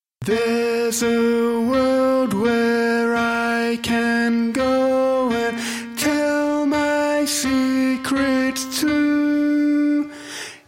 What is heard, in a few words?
tenor; pop